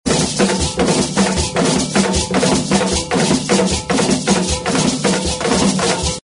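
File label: african dance rhythm, percussion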